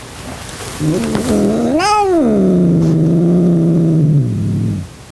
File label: growl; chester